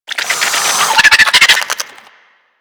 Infested Biped Warning, Enemies Infested Moa Vocals; Warframe